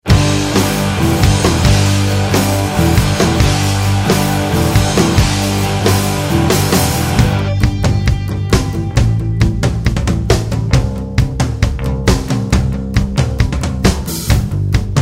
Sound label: Until You Call instrumental